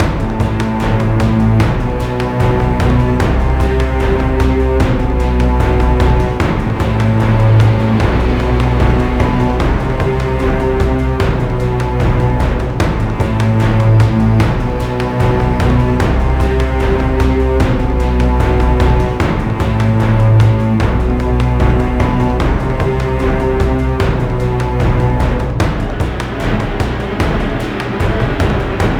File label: Suspense